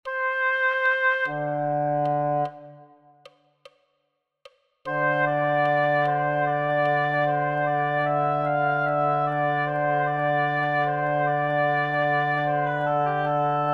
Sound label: tutti